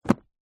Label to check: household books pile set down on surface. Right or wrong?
Right